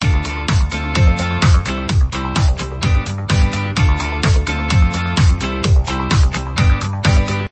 music splash; nickjr trivia engine; ready set solve it

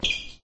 sneaker; nickelodeon basketball stars 2015

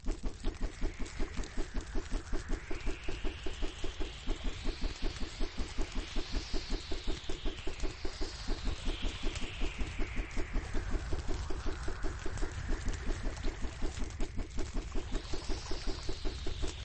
special loop; nickelodeon basketball stars 2015; korra

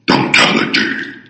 dunkality; nickelodeon basketball stars 2015

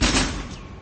dunk miss, basketball; nickelodeon basketball stars 2015

